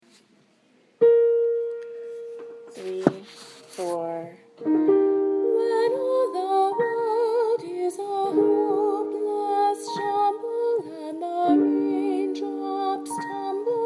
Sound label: alto